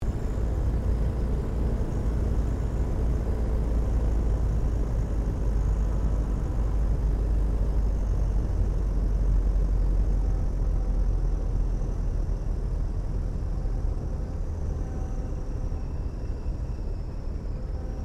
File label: 1916 Sopwith Pup replica touch n go interior, airplane; Airplanes; Sound Effects:Vehicles